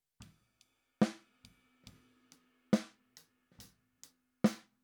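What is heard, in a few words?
Snare Top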